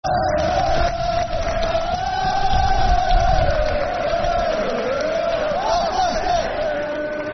Der Der, Football Chants, Soccer Songs; Wigan Wafc; fanchants

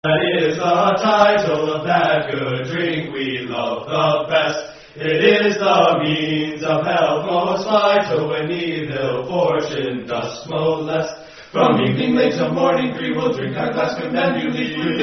Fraternity Song; Quimbambuli; Phi Kappa Psi Fraternity Song

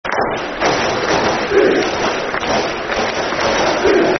Fish (Clap) Football Chant (Soccer Song); Grimsby Town Gtfc

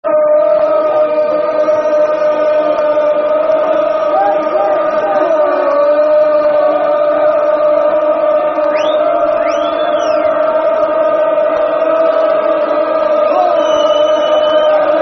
Funny Goal Kick, Football Chants; Plymouth Argyle; Pafc

